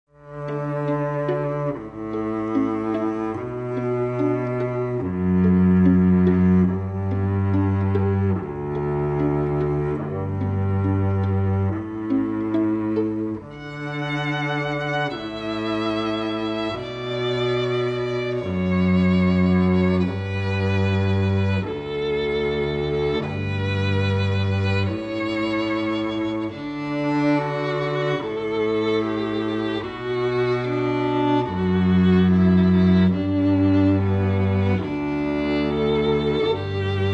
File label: String Duo; Pachelbel Canon